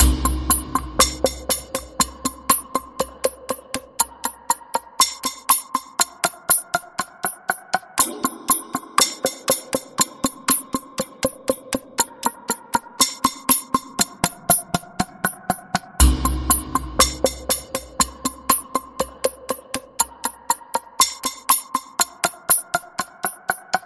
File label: On The Clock, Loop